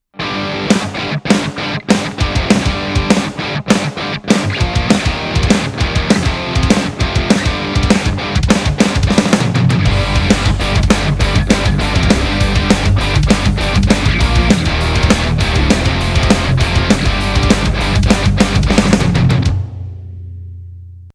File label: Pop Rock Example